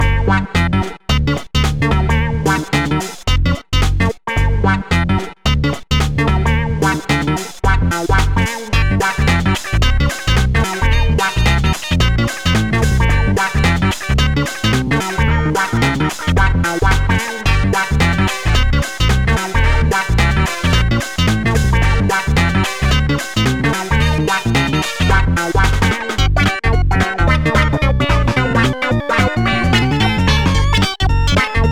Boombox; lethal company; ambience; windows; Question Mark

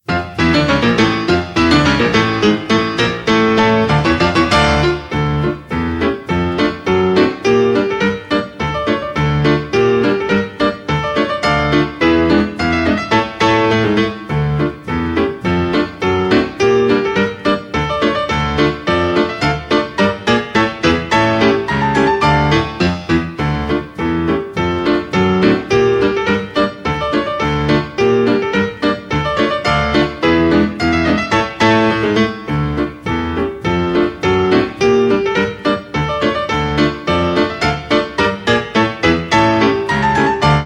Piano Pub; Sherlock Holmes The Devil's Daughter

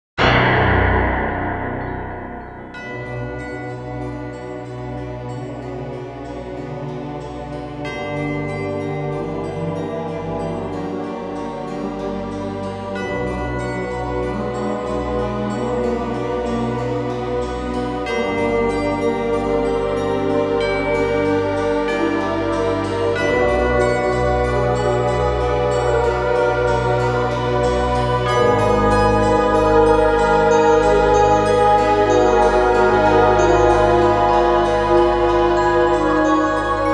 Death; Jak 2

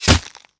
bullet impact ice

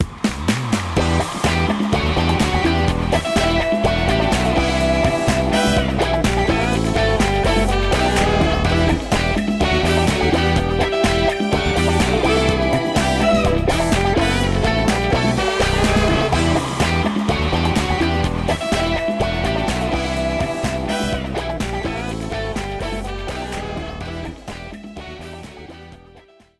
Disco Song; despicable me minion rush full original score soundtrack

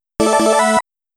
Credit Sound

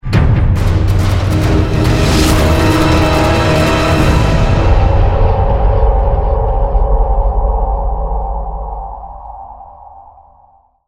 defeat

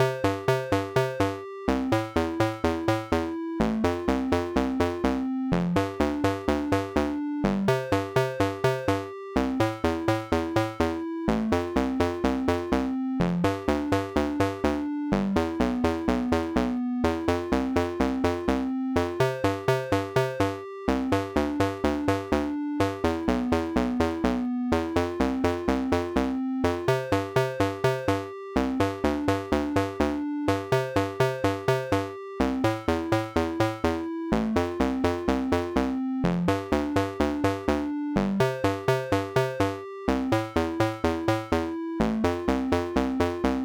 8bit tennis; horace ps4 switch windows xbox one gamerip 2019

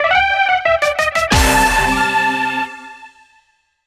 Jingle Start; Clubhouse Games: 51 Worldwide Classics

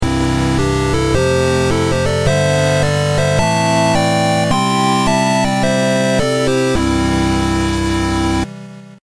Game Cleared; Kid Tripp; 3DS iOS PS Vita PS4 Switch Xbox One Xbox Series X|S

